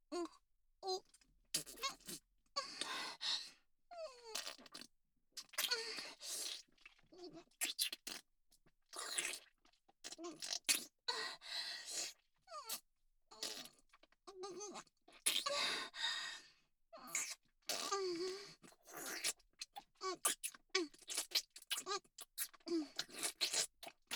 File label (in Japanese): フェラチオ; SEあり